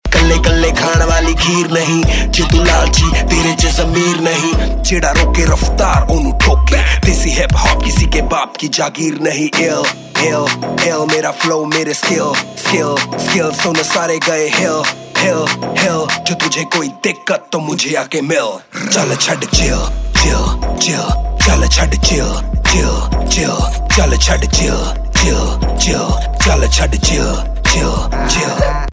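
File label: Remix Ringtone, Punjabi Pop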